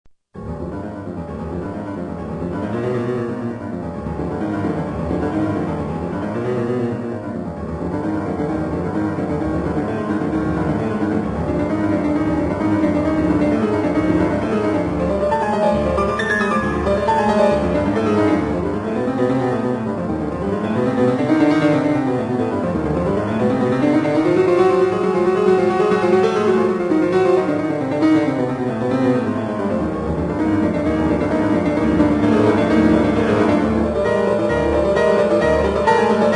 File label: Piano; Bartok